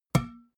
glass door small hit damped

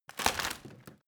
rotten wood break

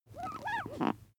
bath duck squeeze soft

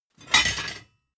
plate armor put down

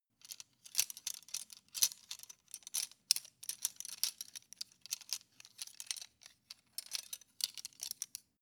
cutlery plastic multiple rattle long

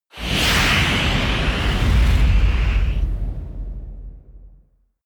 whoosh air

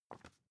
magazine page handle

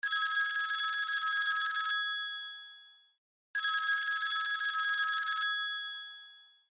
us phone

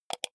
computer mouse wheel click fast

